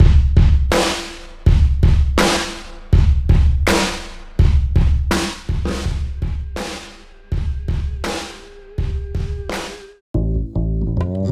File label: Music; BornAgainVirgin; GameOver COMBO